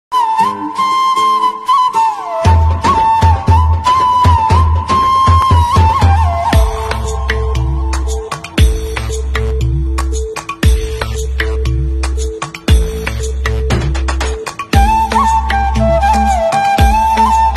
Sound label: song bgm flute, tamil